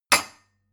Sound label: glass shelve